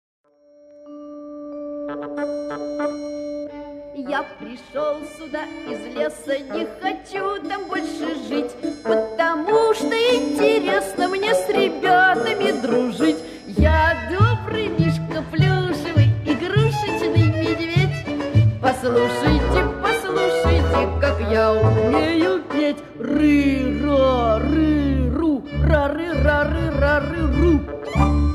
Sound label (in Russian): Pesenka plyushevogo medvezhonka